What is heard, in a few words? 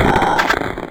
Yggdrasil Attack